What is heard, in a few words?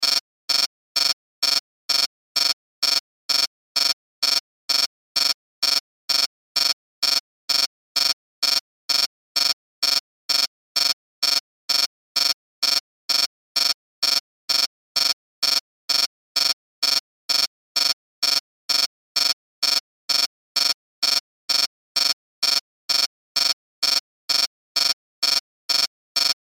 Sci Fi Alarm Buzzer Beeps Technology Readout Loop; Control Panel